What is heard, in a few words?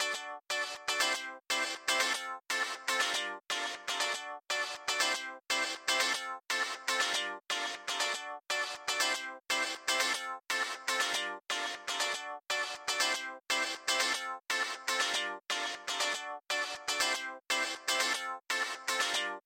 Disco Guitar Ring